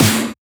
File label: Snares; Vengeance - Essential Clubsounds Vol.4